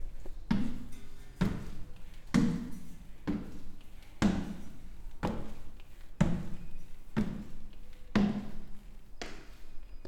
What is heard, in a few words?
Footsteps Walking Stairs